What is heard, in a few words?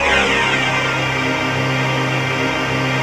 ATMOPAD; Best Service; XX Large; Pads